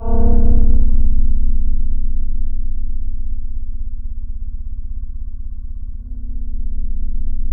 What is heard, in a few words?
C1, Synth Line; 90 s Sample CDs; NiceBeats; SynthLine VOL-24